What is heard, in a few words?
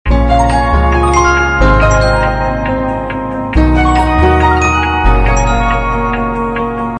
iPhone RingTone; iSpazio; suonerie